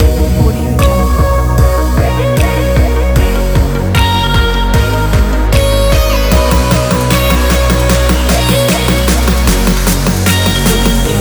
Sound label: abstract future bass; l4dipe